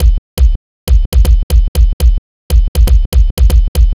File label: Subtractive Beat